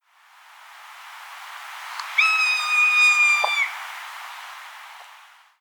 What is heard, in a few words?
Rough-legged Hawk, Buteo lagopus; raptors